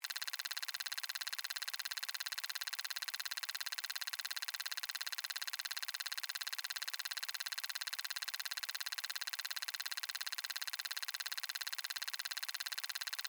Machine Device, Clicks; Scifi; Science, Fiction; Robot; Robots; BlastWave

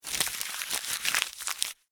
Office Paper Crumple; Paper Sound Effects; Paper (Sound Effects:Materials); SFX Bible 6

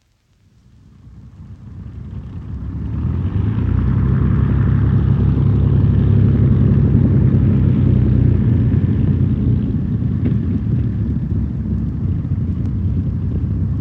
Exterior, taxi-ing World War II fighter-bomber; switching off; From 78 rpm disc; Airplane; BBC

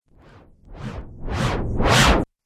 Cinematic Riser Abrupt Stop; Rise And Dive Sound Effects

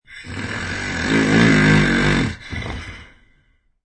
Snore

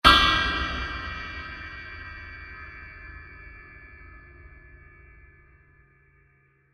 Giant Metal Impact; Bursts; Impacts; Crafted Signals

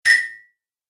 Message Error, beep

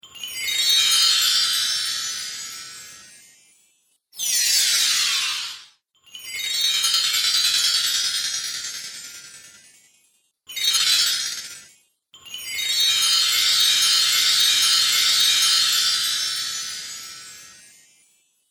Christmas Spells, magic; Magic Sound Effects

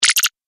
Laser Lock; multimedia

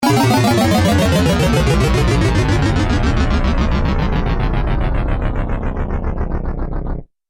Game Mega Fail, Video Game Sound Effects

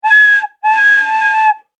Toy Whistle; Whistle Sound Effects; Andrew Fleming

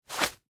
Winter Gloves Rub, Movement, Fast; Foley; Dressing

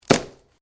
Branches Dead Snap

